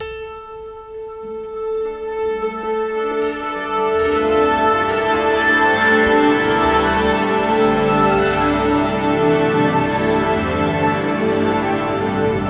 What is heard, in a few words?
orchestra, Orchestral Tune; SFX